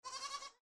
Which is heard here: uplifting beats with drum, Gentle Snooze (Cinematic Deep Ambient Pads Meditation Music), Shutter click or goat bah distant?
goat bah distant